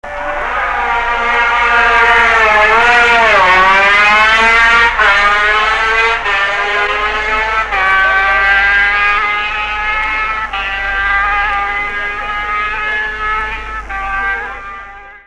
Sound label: Yamaha 125, vintage bike; 1966